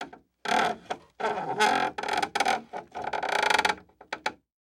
Household Gate Wooden Gate Squeak

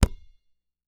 Sports Basketball Hand Slap Single

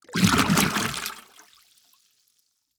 Liquid Water Water Jug Fill With Water

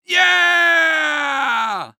Human Male Yell Yeah

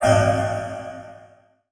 Buttons Mechanical; Multimedia Internet CD-Rom Flash

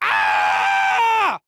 Horror Scary Human Male Scream

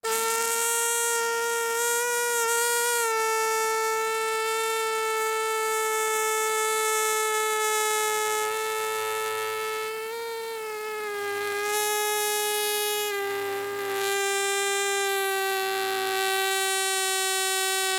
Cartoon Balloon Release Air Long

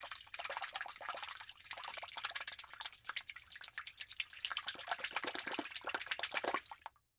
Liquid Water Water Fingers Running Across Water

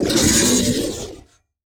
Production Element Title Transition Demonic

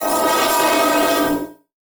Transition Metallic Distortion; Production Element

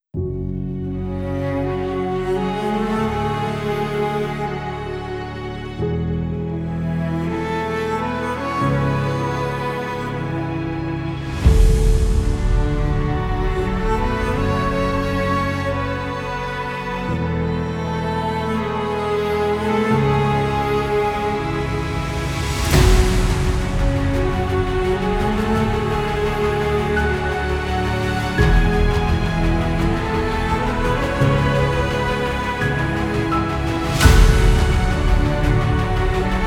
Cinematic Beauty

